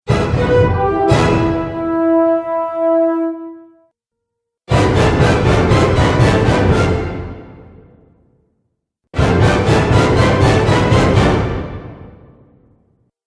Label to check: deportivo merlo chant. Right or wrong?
Wrong